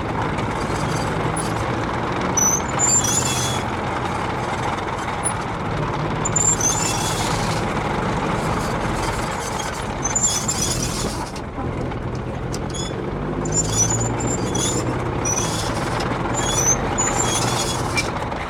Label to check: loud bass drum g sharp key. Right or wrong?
Wrong